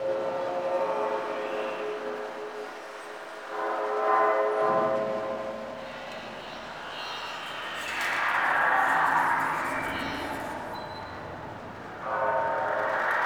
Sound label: Interior Hallways Lab Bed Loop, Ambience; Warframe; Entrati